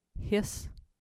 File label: hiss